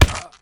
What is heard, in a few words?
body impact self; physics